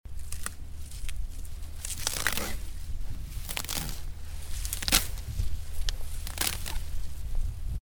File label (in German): rupfen